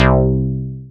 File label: bass, synths